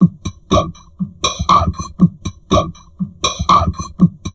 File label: TALK, Drum Loops Crazy Processed